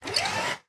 eagle boss Heart Close; unsighted; enemies

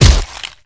Bounce

